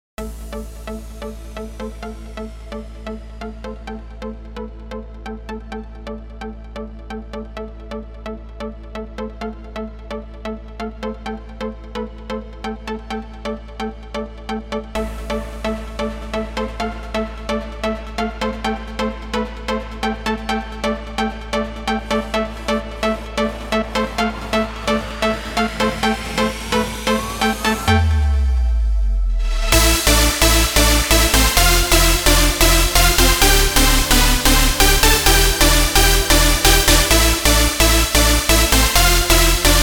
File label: edm; סט מקצבים לקורג